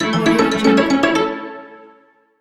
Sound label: the cartoon game ending